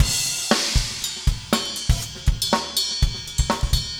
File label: funk, 120bpm, loops